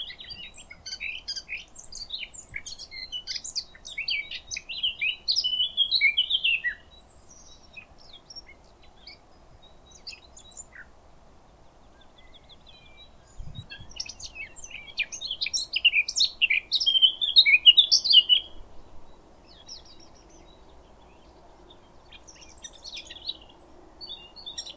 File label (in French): Fauvette a tete noire